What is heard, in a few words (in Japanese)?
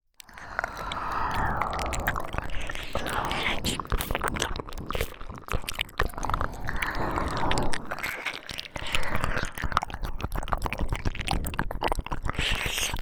左右同時 耳舐め, ASMR